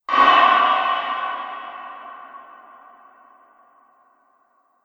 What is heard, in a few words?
Mangled Metal Impact; Miscellaneous Impact; Andrew Fleming